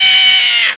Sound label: death; Mini Militia